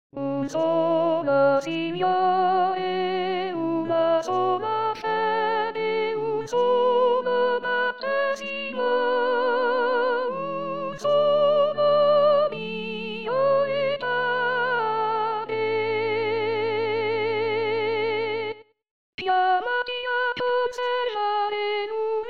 Soprani

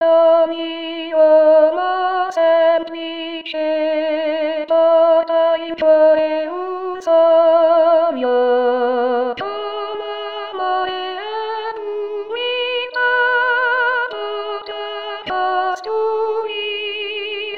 Voce

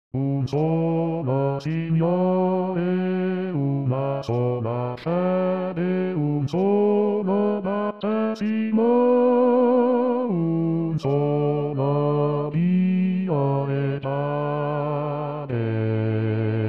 Bassi

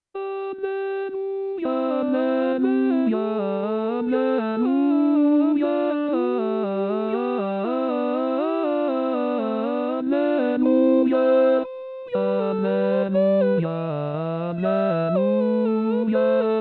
Inno Di Pasqua coro; Bach

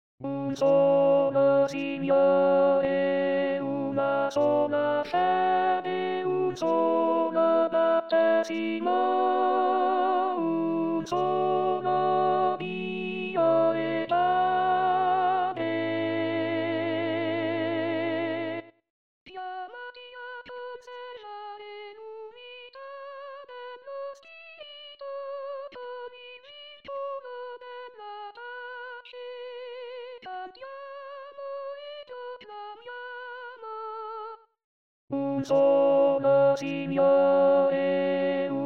Un Solo Signore Contralti

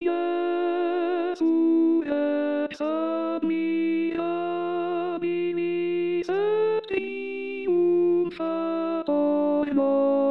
Contralti; Jesu Rex Admirabilis Palestrina

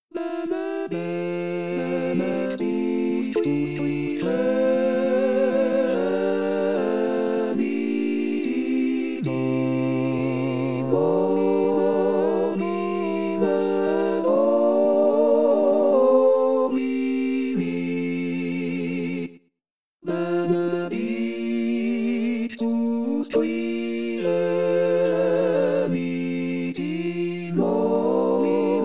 Benedictus Coro; pasqua

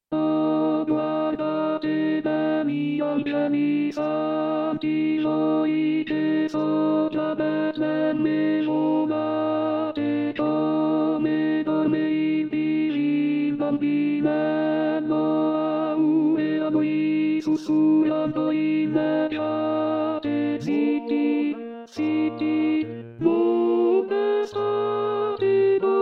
Contralti; Oh Guardate Begli Angeli Santi; natale

